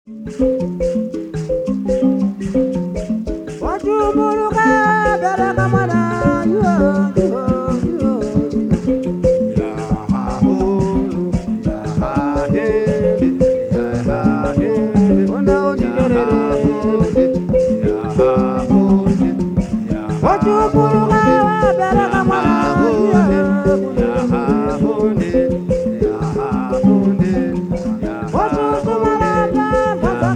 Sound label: mbira